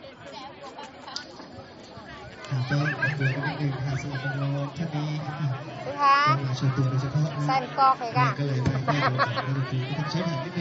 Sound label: country band